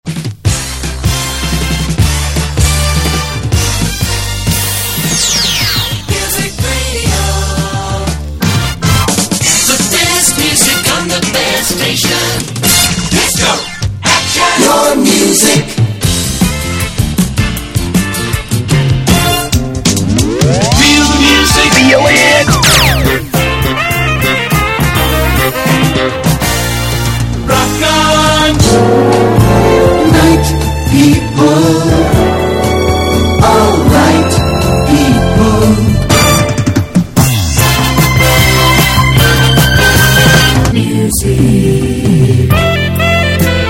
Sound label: disco jingles